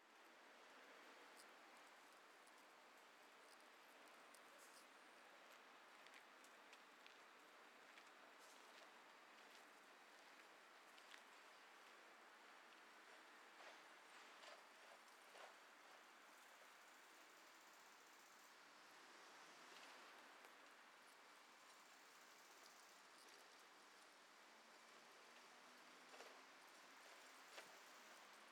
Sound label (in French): Vent Brindilles Fleurs; Petite Ceinture